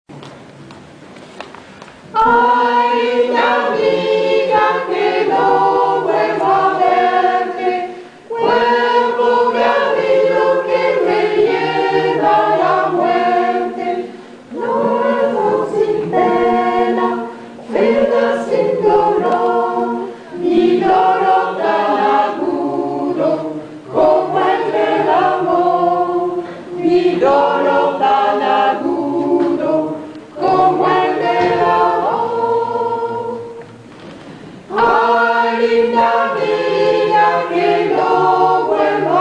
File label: concert; telethon